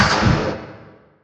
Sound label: Snare FX layer